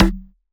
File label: Percussions